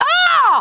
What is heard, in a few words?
ahh